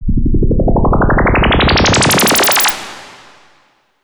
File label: ups